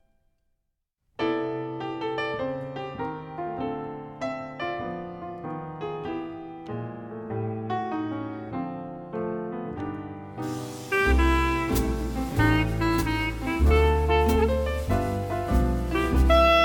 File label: Tape Harbour Jazz Band; swing sessions 2018; tape reel to reel